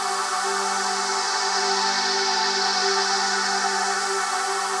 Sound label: Pad Loop G, shimmer and sparkle; Pad Loops